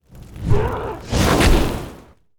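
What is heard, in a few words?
dark spirit attack; monster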